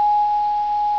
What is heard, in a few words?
chimes